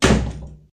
door

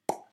pop

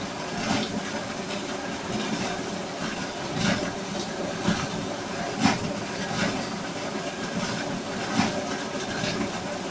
loop water

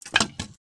wood

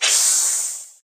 air

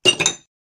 glass

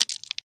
stones

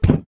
wood hit

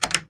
door

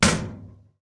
metal hit

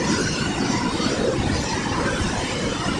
loop ambient

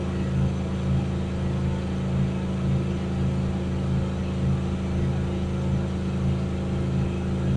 loop machine

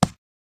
hit

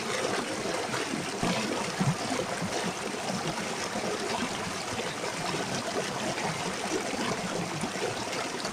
loop water

